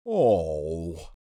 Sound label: Disappointed male reaction